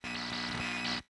beeps squawks static

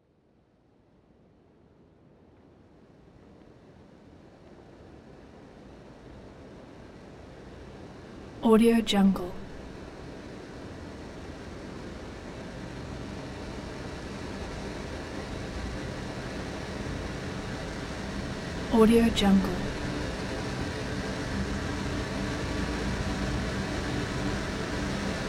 intense dark undertone

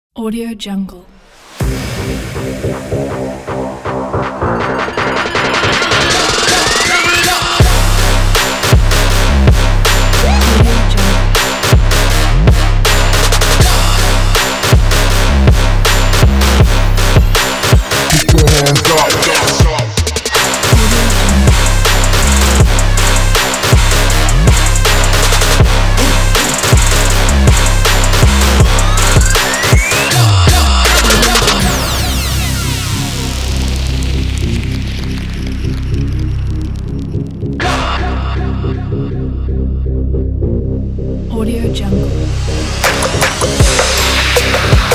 it trap

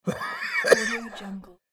wheezy cough

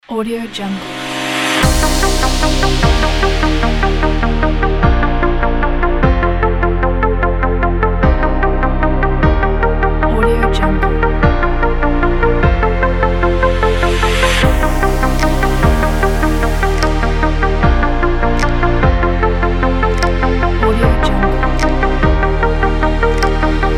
technology abstract; owlstockmusic

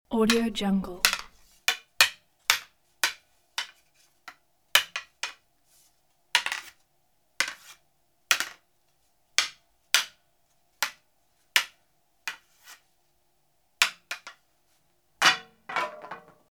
big wooden sticks fighting hitting

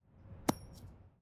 coin toss large metal flipped

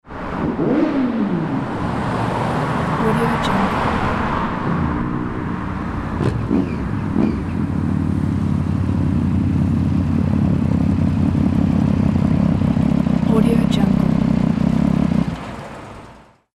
motorbike arriving

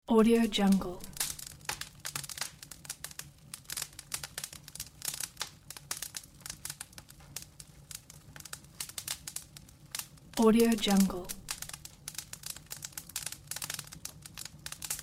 burning fire; oystudio

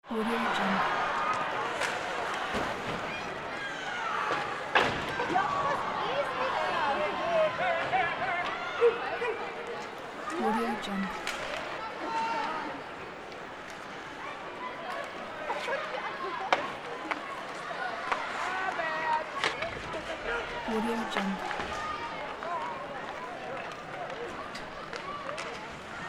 ice hockey game and crowd

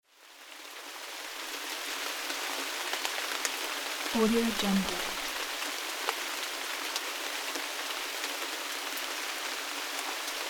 rain

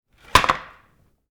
wood chair falls backwards; prosoundfx

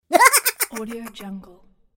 cartoon fun laugh

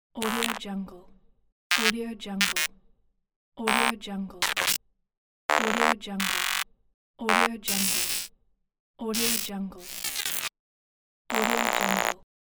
micro noise

